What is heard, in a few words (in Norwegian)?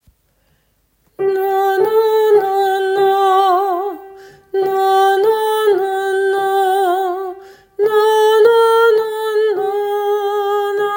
glade jul vers tenor; åfjord